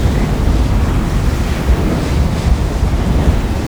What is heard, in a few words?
blackhole b; glasgavelen